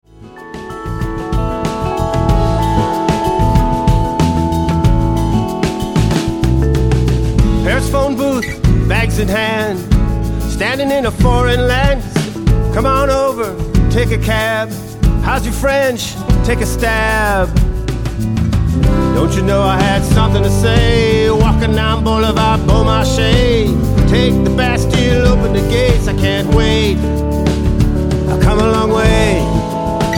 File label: Blues